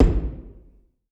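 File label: Circle Red Stairs Wall Tom, Music Hall Percussion; Aberdeen Music Hall; Circle Railings Ride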